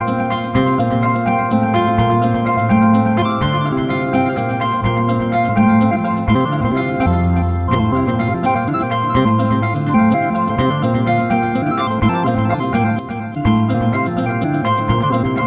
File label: lg ringtone; k3 original lg